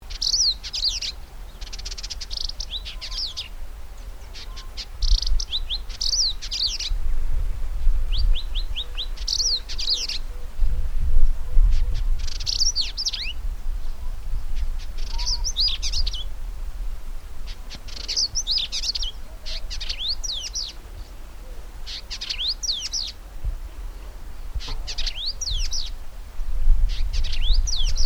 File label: Vidua purpurascens; Indigobird Song